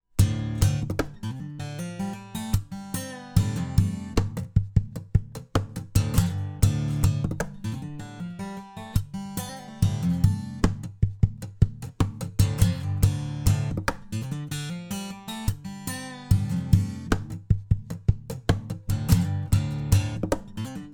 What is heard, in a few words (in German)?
niere perkussiv korpus hals bauch; fame pro series sd-100 test